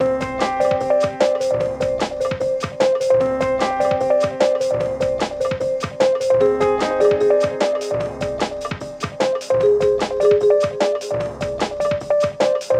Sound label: Simple Background Loop